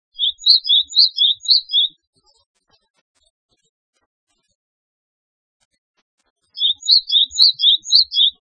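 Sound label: Japanese tit; sijyukara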